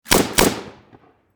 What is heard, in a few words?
Warfare Machine Gun Bren Lmg Double Shot Close Perspective; Machine Guns